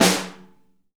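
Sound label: ROOM SNARE, drum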